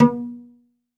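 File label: SOLO CELLO, Synclavier Strings; ILIO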